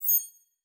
Digital Click, Sci-Fi Sounds Interface

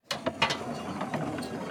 engine start, sci-fi sounds, mechanical

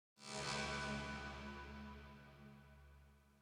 Suspense Transition, Horror Sci-Fi Music

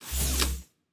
Door, Sci-Fi Sounds

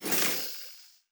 Door, Sci-Fi Sounds

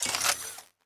Weapon Reload (Laser); Sci-Fi Sounds

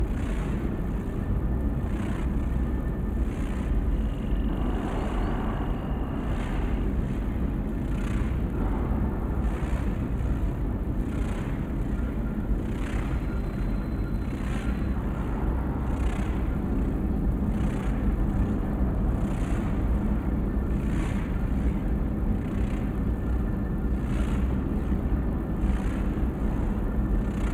Machine Room Loop, Sci-Fi Sounds Hum and Ambience

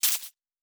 Spark, Electric

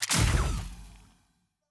Weapon Shoot

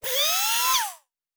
servo small, sci-fi sounds, mechanical

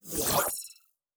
Teleport, Sci-Fi Sounds

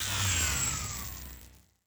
Weapon Stop (Laser); Sci-Fi Sounds

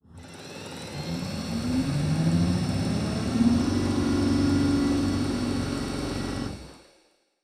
servo big, sci-fi sounds, mechanical

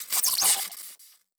Glitch, Electric

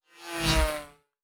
Fly By, Sci-Fi Sounds Movement

